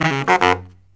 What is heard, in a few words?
Killer Brass Riffs